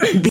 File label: voice female effort quick action